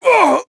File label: HIT; BattleSNDS